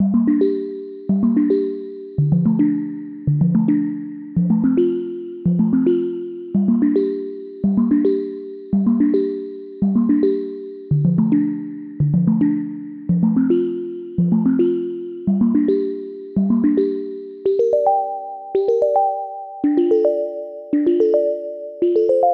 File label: Key, 110 BPM G Min; Cymatics; Infinity Melody Collection; Saint Tropez